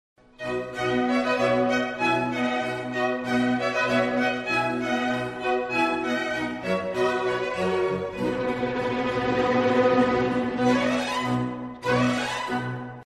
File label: mp3 128kbps 6 fois